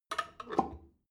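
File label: drain valve close heavy, bathroom; haus garten; badezimmer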